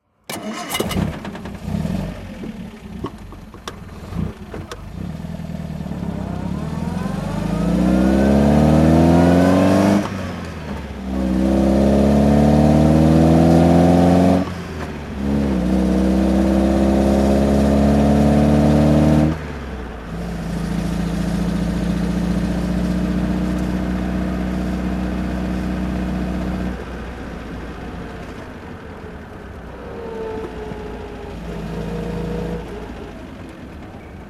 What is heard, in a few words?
Alvis TA 14 special driving, Engine sound driving; Alvis TA 14 special, 1950; V3D/ ClassicarImages